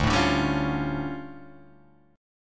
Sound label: D Minor Major 11th, down; DmM11; strummed